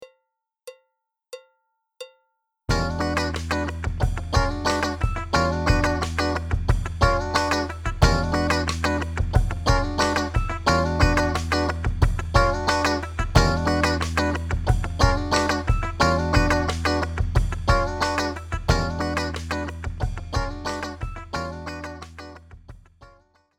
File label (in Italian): Esercizio, guitar